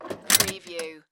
Pinball sound, ball moves triggering several metal levers